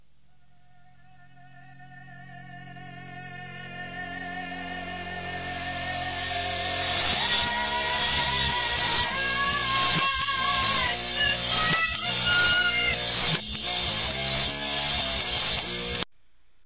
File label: Reversed